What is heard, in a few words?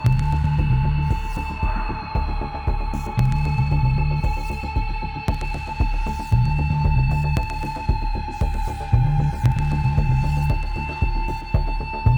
End Of Round Lose Tension Loop; battlefield hardline; ps3 ps4 windows xbox 360 xbox one